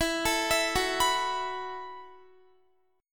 E Suspended 2nd Suspended 4th E, down, Guitar

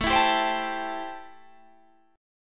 C 6th A, chord

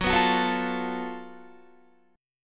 Gb Minor Major 7th Double Flat 5th Gb Guitar; strummed

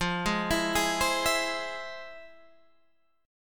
F Minor Major 7th, F, Guitar, Open G; 15,13,14,13,13,14

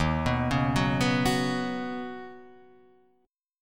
D sharp Minor 7th Sharp 5th; arpeggiated; JGuitar

